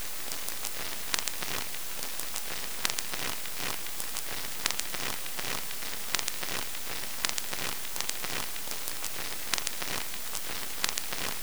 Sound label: vinyl record crackling looping